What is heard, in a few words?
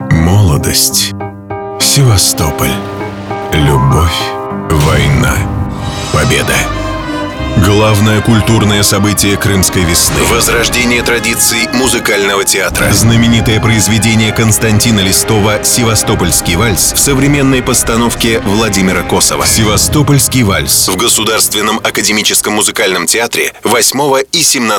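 Waltz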